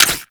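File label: crossbow Mech; Warframe; Tenno; Primary Xbow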